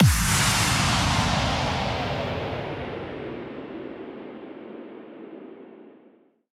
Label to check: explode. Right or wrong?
Right